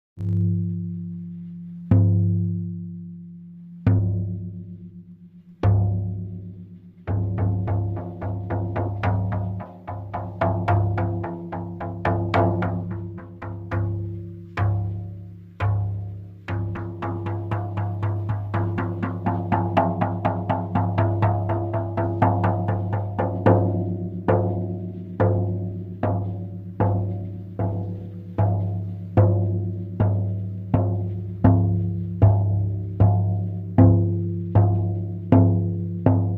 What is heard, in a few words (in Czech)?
kokopelli, samansky bubon 45 cm